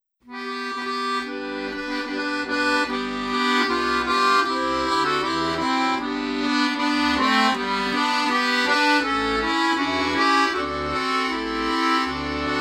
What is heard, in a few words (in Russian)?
музыка